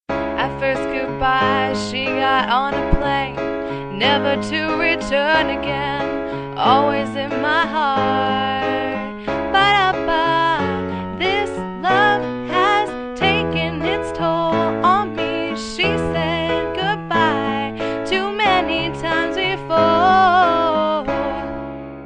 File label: piano